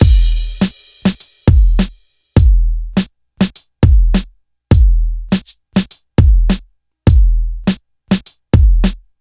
102 bpm breakbeat sample e key

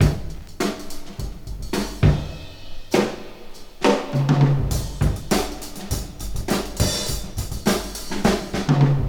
106 bpm breakbeat sample g key; drum loop

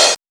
natural open high hat sound f sharp key

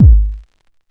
rich lows kick drum g sharp key

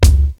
sharp kick drum one shot c sharp key

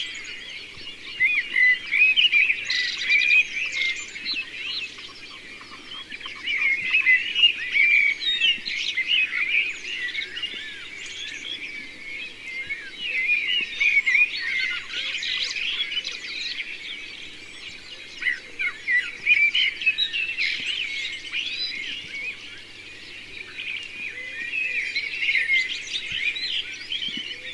birds singing in mixed forest

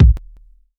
wet steel kick drum a sharp key; Mono; kick drum samples

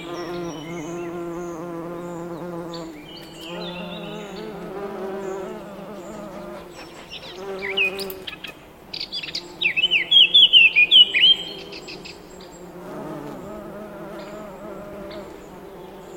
blackbirds and bumblebees

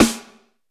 roomy snare drum sound A#

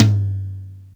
big room tom one shot g key; tuned drums g key

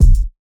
rich low end kick single hit b key; kick drum samples

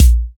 rich highs kick one shot a sharp key

